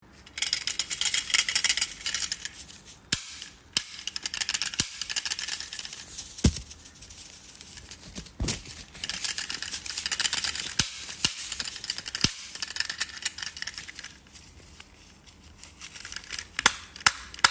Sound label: Ensemble